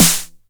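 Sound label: 909 SD Tape, snare drum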